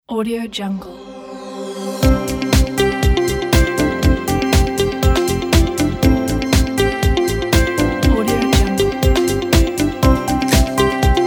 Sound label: uplift ident